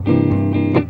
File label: EGUIT CHORDS; Best Service; World Colours